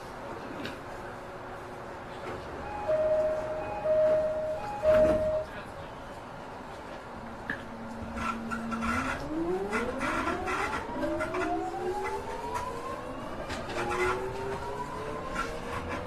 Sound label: chuo sobu local 209-500, train